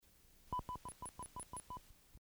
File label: autotuning decks tone gxr88